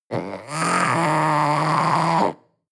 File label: panther young cry